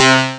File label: elec guitar; ST-XX Amiga Soundtracker Sample Packs